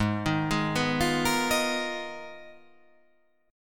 G sharp Minor 6th Add 9th

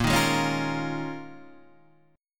D sharp 7th Suspended 2nd Suspended 4th A sharp

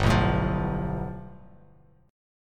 G 9th, Bass Standard; strummed

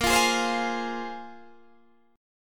Bb 7th Suspended 2nd Bb, Guitar Open D

